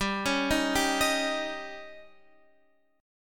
C sharp Suspended 2nd Flat 5th G, chord

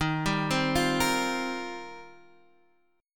Eb 6th Add 9th Eb, Guitar Half Step Down

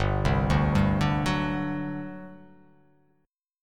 G Major 7th Suspended 4th, G, down; GM7sus4 arpeggiated

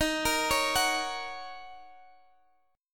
D sharp Minor 7th, Guitar, Standard; x,x,13,15,14,14; D#m7 arpeggiated; capo=3

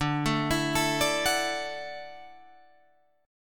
D-Major Add 9th D down Guitar Drop D

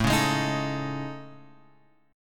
A Major 7th Flat 5th, guitar; AM7b5 Chord {5 4 6 X 4 5} strummed

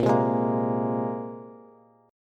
Bb 7th Suspended 2nd down, Bass; Bb7sus2; strummed; JGuitar

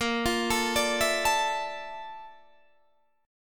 A sharp Major Sharp 11th, Guitar, Open D

